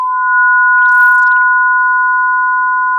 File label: pop, sound of a tea kettle whistling